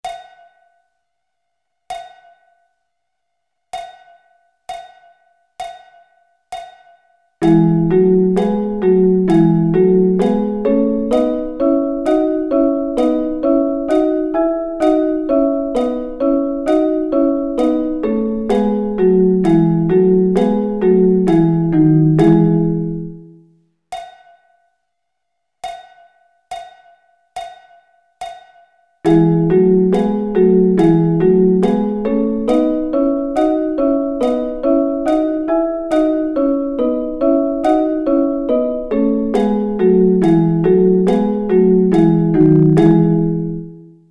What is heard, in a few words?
E Double Stops